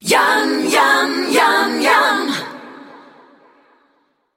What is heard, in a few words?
Audiologo vocal; YAMM MU MIX